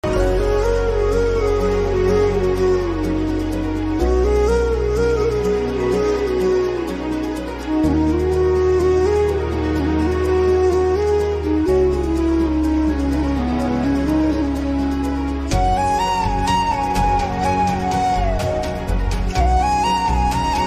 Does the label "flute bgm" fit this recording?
Yes